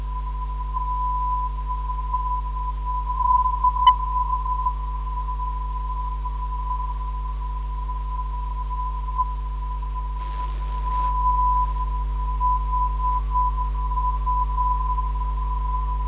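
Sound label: THS 344.6kHz, non-directional beacon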